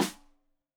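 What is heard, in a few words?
Snare Stereo, Drums; Deeply Sampled Drums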